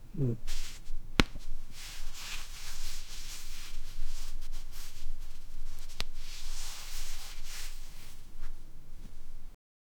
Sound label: rubber leather rubbing; texture